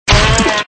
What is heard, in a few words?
Snack Destroy; scoobysnackmachine